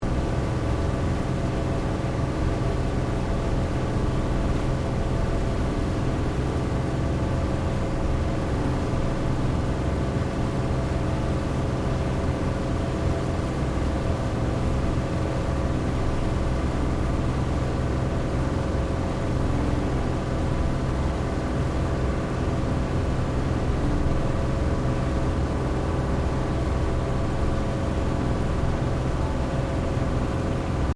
BOAT WATER TAXI LARGE FAST LOOP, Large river water taxi; Williamstown; Boats (Sound Effects:Vehicles)